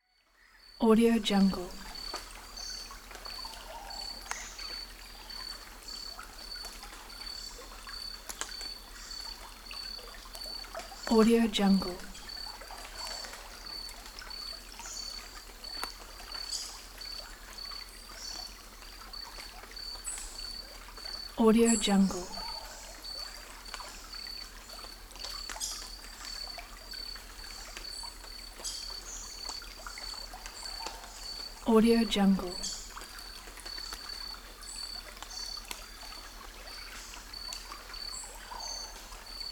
tropical forest night

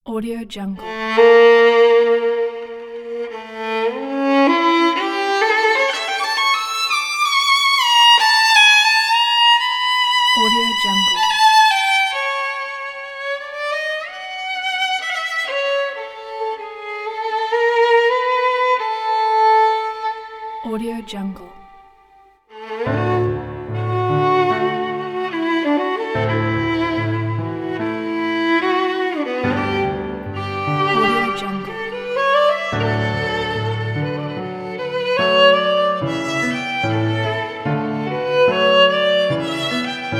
violin concerto; tchaikowsky

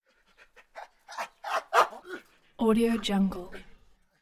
chimp barks

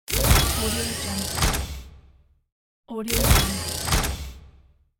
scifi door

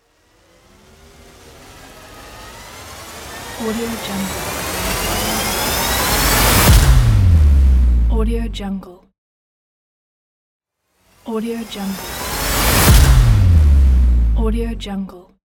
riser hit

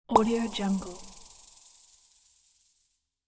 plop rattle

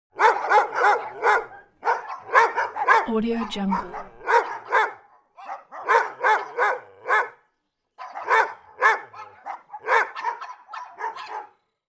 animal dog dogs neighborhood bark close; smartsoundfx

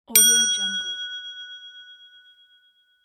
single bell hit

